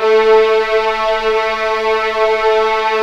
A3 MF, VIOLINS; East West; The Ultimate String Collection

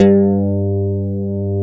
GTR CH STRT, Clean E.Gtr Death Strat

